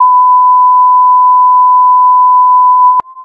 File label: sine amp mod removed